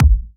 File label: Bassdrums; Vengeance Samples